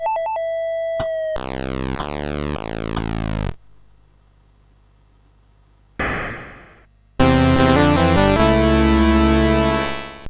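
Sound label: Western, MOS 8580; CSG8580R5; Omega Factor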